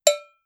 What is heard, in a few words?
cowbell sharp hit; mixkit